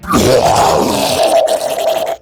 Death, Dialog Barks Battle; Warframe; Grineer Ghoul Devourer

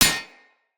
Short Sword Medium Impact Armour; Weapon Impacts; Player; Swords; Combat

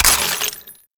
Ammo Pickup Radiation; Ammo Ability; Warframe; Warframes; Frumentarius

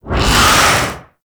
Symbiotic Link Cast; Warframe; Infestation

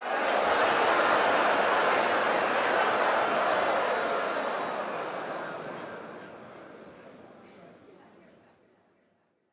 Crowd Excitement Trail Off; Lunaro

